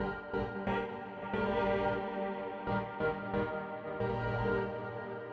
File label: SYNTH PAD, INDUSTRIAL; Sphere